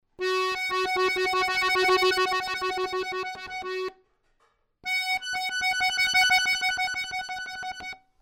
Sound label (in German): oktavtrill real